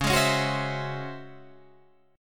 C sharp Minor Major 11th, Guitar Open G

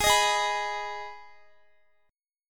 Ab Minor, down, Guitar; strummed; 4E,4A,5D,5G,5C,6F

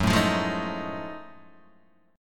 F sharp Minor Major 13th, Guitar DADGAD; chordshape; down

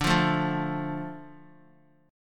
D Minor D Guitar; JGuitar

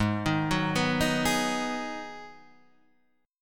G sharp Minor 7th, Guitar Half Step Down

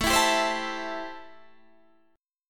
A-Minor 7th A; strummed; Am7

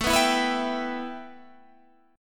A 7th Suspended 2nd A, Guitar Standard; A7sus2 strummed

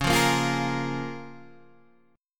Db 6th, Guitar, DADGAD

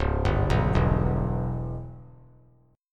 C sharp Minor 7th Flat 5th; C#m7b5 arpeggiated; 1,2,2,2; JGuitar

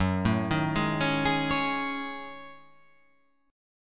F sharp Minor 6th Add 9th; chordshape; down

